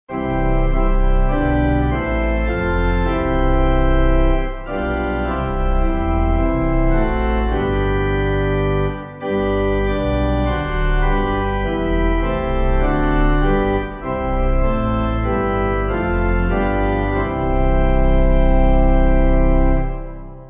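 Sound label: Pipe, hymn backing; Lord God The Holy Ghost; Tytherton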